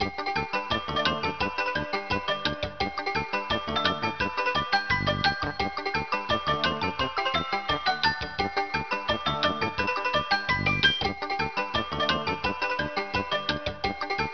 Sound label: Bonus; Gals Panic II; arcade